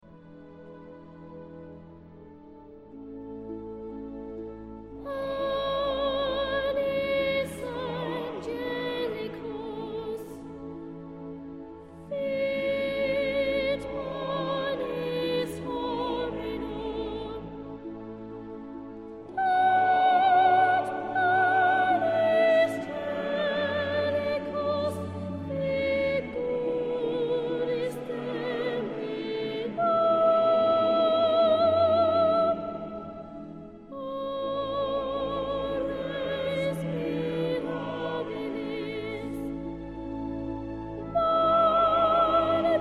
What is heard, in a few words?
Panis Angelicus vocal, string quartet; Franck